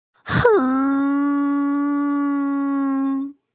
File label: Hhhhhhhmmmmm